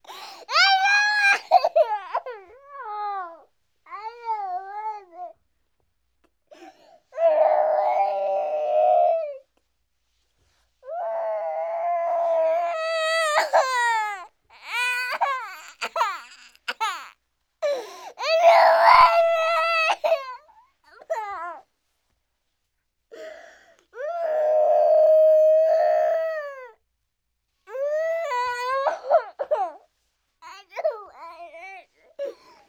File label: Crying Small Child; Vocals & Wallas